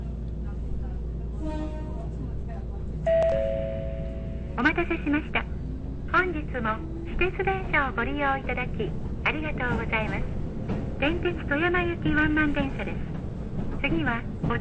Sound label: train; chitetsu unaduki